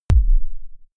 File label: sub kick noise short